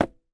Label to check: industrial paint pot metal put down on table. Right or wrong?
Right